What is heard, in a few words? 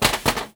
Foley